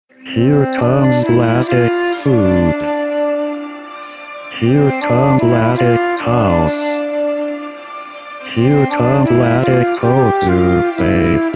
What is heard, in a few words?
C D E F D C D E F D C D E F G F E D C D E F D; chemtrailArtTrash